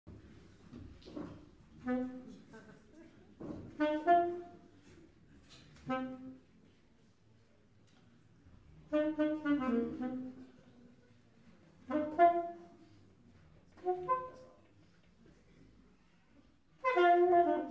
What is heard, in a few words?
alt drums